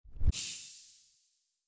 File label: Ui Save